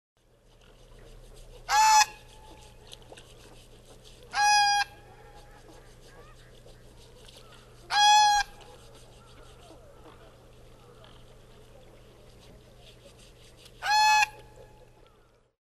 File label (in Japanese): kobuhakuchou, kagoshima, koe